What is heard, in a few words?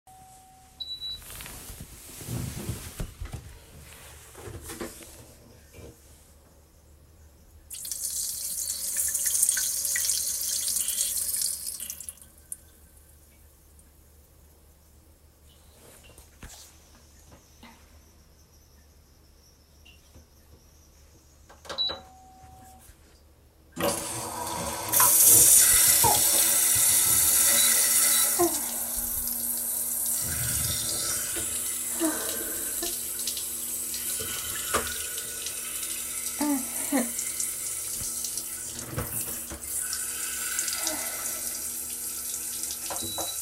toilet, ASMR